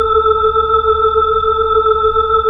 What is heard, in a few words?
B-3 Chorus